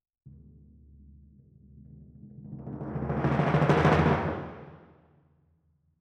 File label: Timp Main